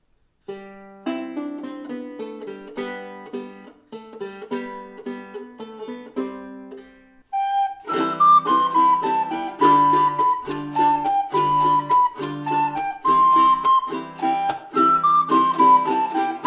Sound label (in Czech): flétna + barokní kytara; Malý Bimbo; Flautoškola 2 – METODICKÉ NAHRÁVKY a DOPROVODY